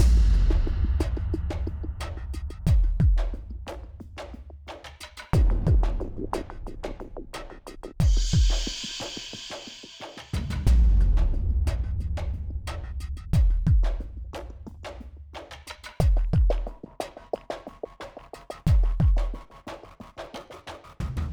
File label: Base Loop; bakugan champions of vestroia 2020; switch gamerip